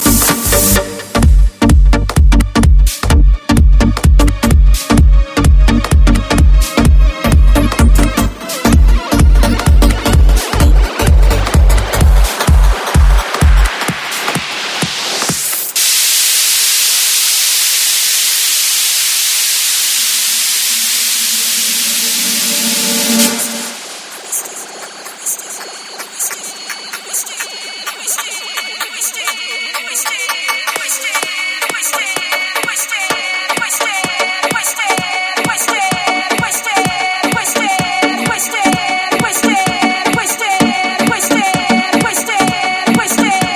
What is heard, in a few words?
LOFI